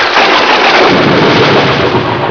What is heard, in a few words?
thunder